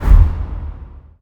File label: Menu Inspector Window Close; Custom UI Sounds; Dark Souls 3